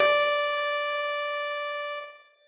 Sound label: piano